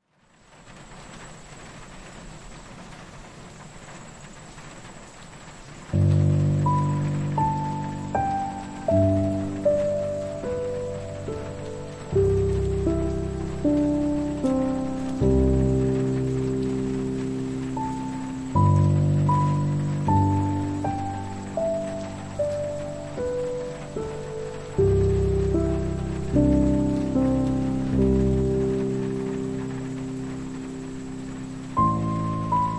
Night Time Piano and Rain

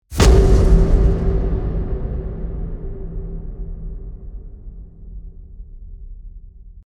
Kong Hit Deep; Ninja Tracks

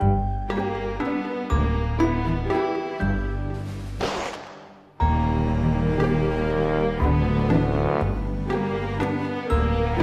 Clumsy Ambient

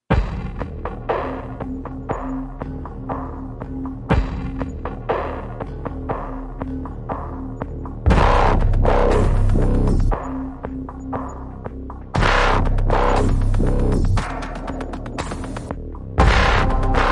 Trained Killers (No Orchestra, No Choir); Ninja Tracks

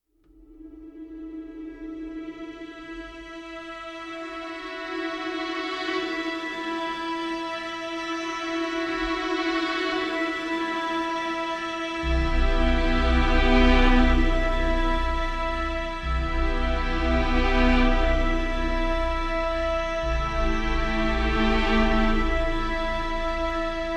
Ambient

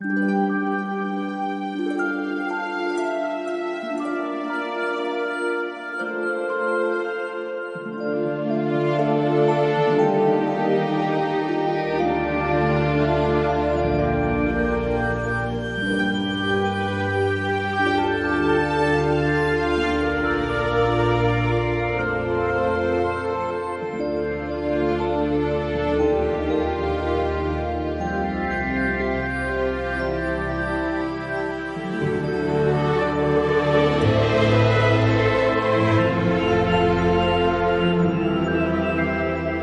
Heaven Ambient

